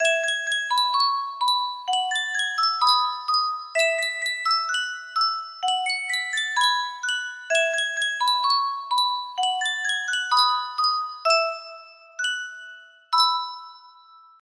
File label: sankyo custom tune music box